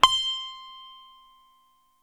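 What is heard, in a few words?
SITAR C 5 right, coral sitar, guitar; East West; Steve Stevens Guitar Samples Collection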